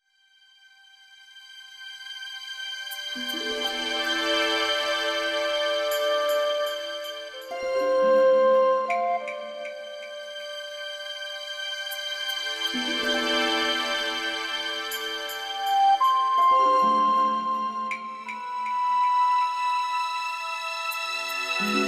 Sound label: Hotspring Cave